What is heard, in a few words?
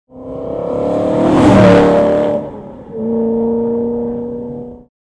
drive by, exhaust systems; borla